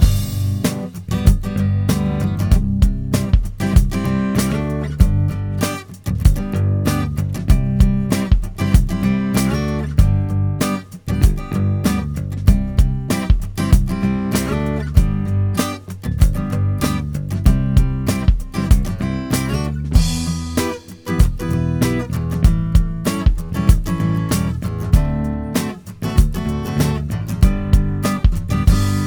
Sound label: Laid Back Vibe, no keys